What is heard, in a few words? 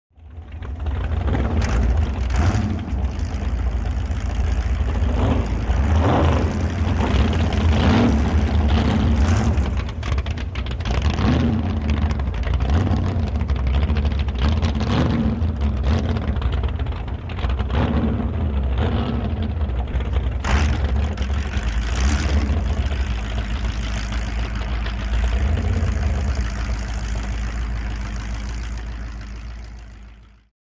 Drag Boat Idle revs